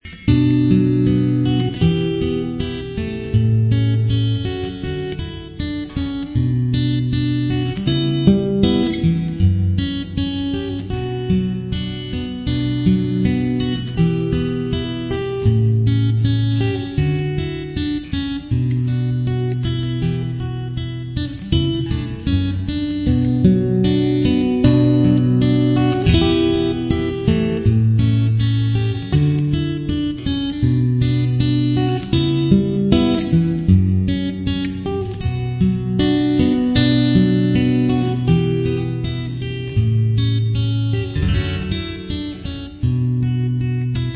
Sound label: romantic